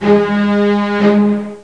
strings

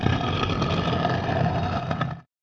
ELECTRIC

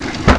drawer open; Dabbler

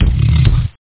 walk

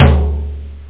dry tom; toms

